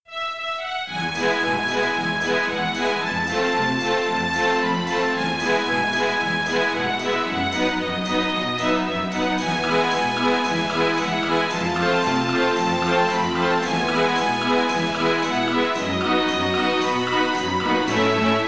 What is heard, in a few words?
Marching; littel Merhen